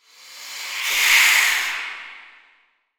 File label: Symbol Medusa; netent